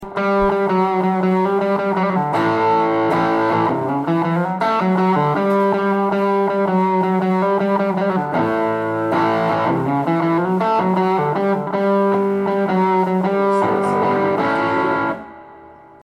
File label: bassline sample; Seattle